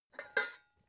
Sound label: pots clank ring